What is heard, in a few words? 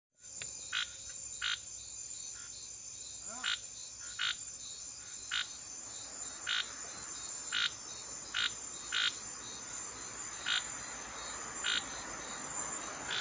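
Scinax boesemani, frog; guyane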